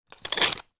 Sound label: foley glass broken bit scrape; zapsplat